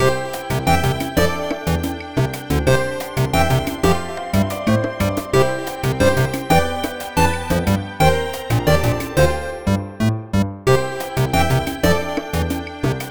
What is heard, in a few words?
Menu (Full) music